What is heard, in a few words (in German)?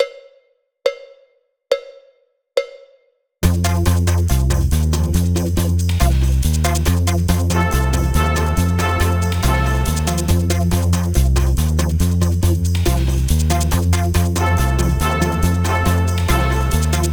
Akzente 3er Verschiebung Tmp 70; warm-ups